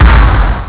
bomb

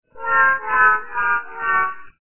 wawa; monster shop

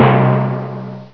TIMPANI; Dark Forces Instruments

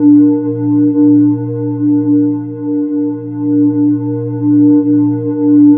enviromental shield idle

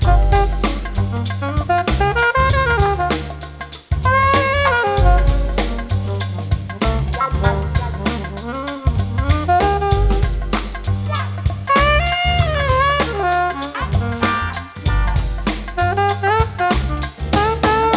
jazz; Audio Images Interloops

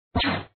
shoot sound

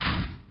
IF ARM EQUIP; axisdemo

